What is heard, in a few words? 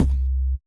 kick, Roland MC-909